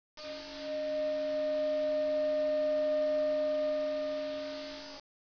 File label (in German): unterblasen klein, multiphonic